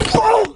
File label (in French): chute